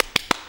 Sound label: MULTCLAP, MULTICLAPS; Best Service; World Colours